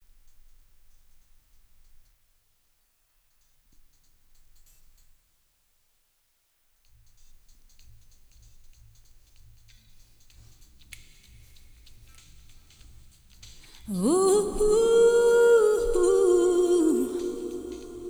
sing

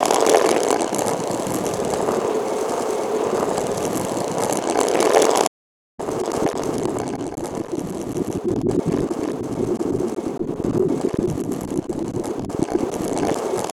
Stone Roll; Resynth

